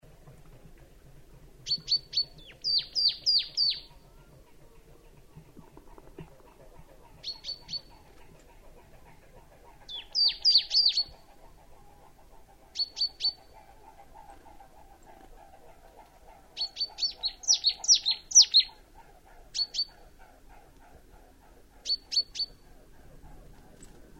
Parpal, Laulu / Song, Käina, Hiiumaa, Viro / Estonia, Viitatiainen / Marsh Tit (Parus palustris); Linnut